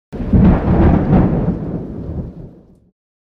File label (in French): orage; energie